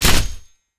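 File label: naginata, player impact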